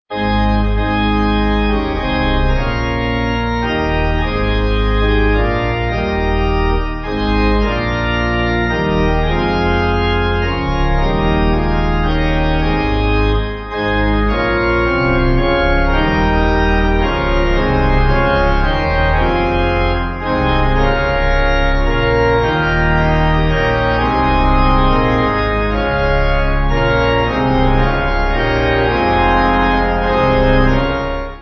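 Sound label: Pipe Organ, Music Backing Files for Churches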